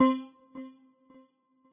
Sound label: lose